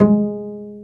PIZZ BASS; E-MU Formula 4000 Series Vol. 2

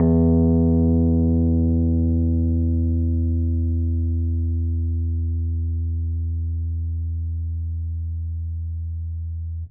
E2 MID, Rhodes Mark II; CEPCo Sample Series Vol. 3

